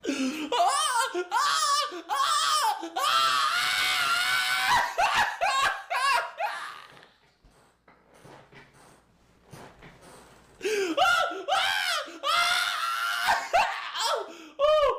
laughing scream

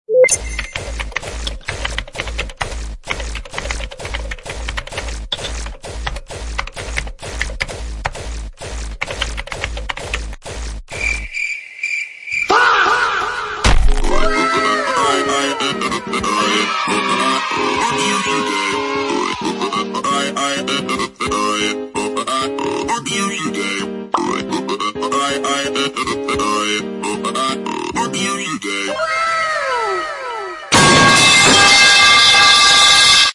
click clack

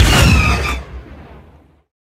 cannons

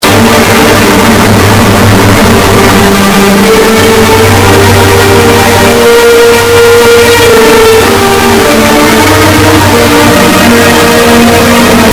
distorted sound

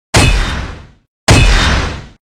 crit hit

